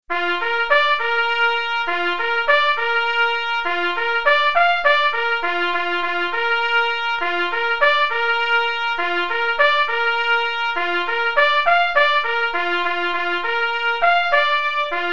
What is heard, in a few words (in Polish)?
pless solo